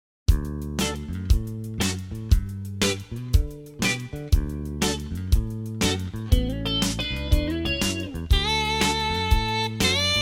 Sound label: shuffle version